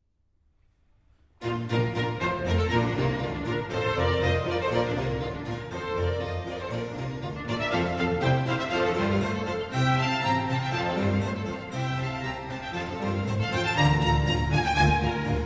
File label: Cello Concerto in B-Flat Major, Allegro